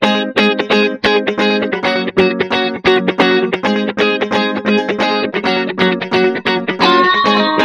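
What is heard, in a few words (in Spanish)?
GUITARRAS; multitracks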